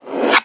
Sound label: Hard Slide Accelerate